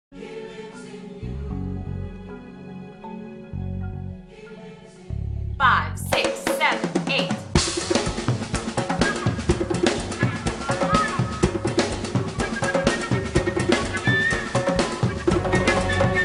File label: Dance MUSIC; Dance Scholarship